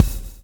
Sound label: swing